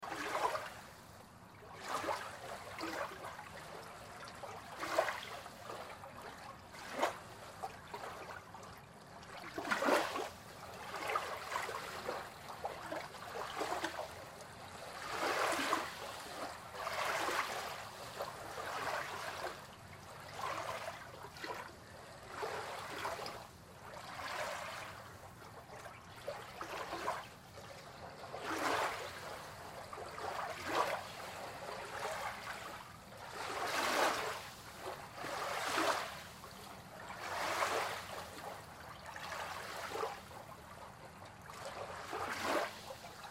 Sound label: Pier Underneath Ambience, Water Pier Underneath Small Waves Distant Traffic Ambience; Ocean; Blastwave